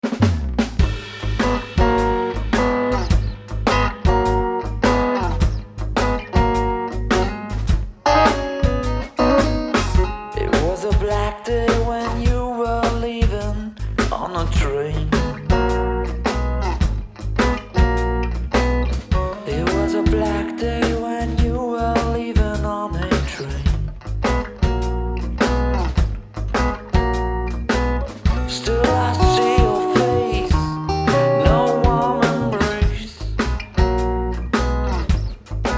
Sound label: Blues Band